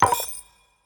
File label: ambient game wet item collect; esmteam